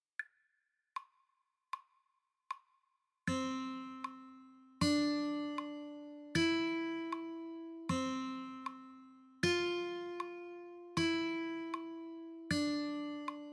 strings 1 2 half 3

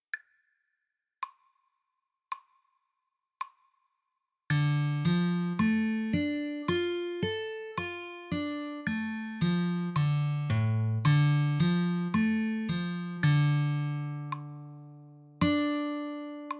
C minor Arpeggio slow Form, guitar

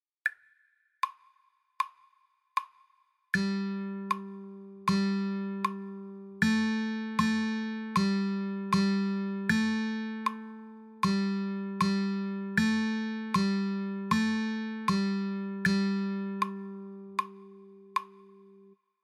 3rd String G, guitar

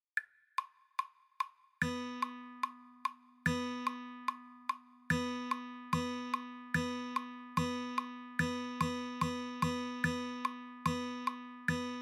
rhythm practice fast, guitar

